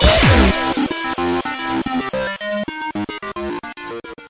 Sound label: crit hit